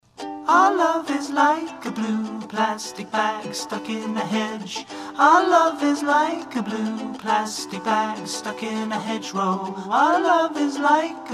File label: short song